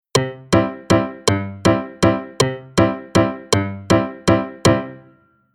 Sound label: Rhythm